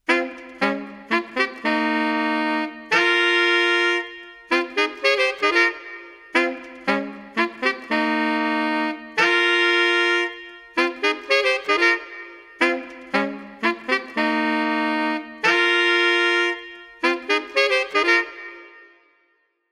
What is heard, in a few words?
brass hall wet; bric m17